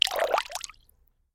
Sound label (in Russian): voda potok aktivnij blizkij